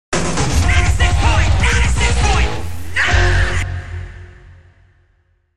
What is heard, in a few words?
Jingle, radio